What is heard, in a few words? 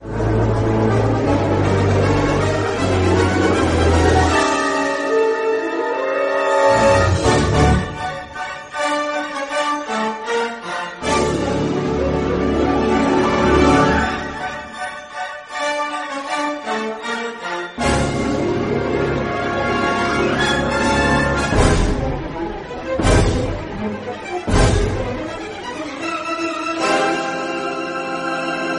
Train Music; Cannon; MoM